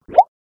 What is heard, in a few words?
new message